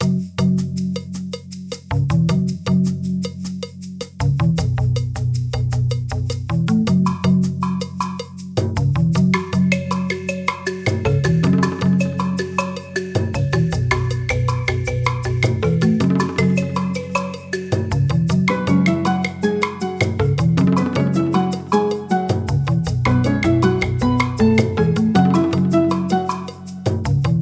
MUSIK; MOBICLIC 84

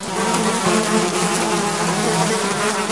bienen; blackshoot

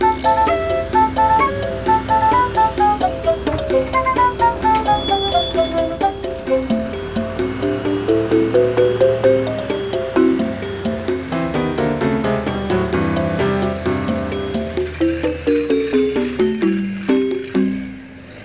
MUSIK; MOBICLIC 89

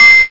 kling

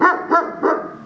Hund gros; Break; Austral

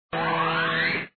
Waffe Raus; cyballs

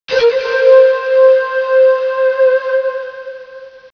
pfloete

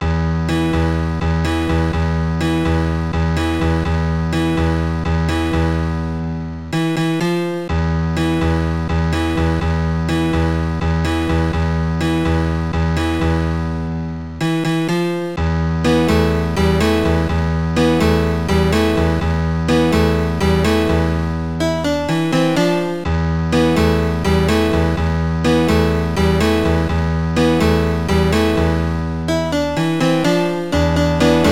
klavier

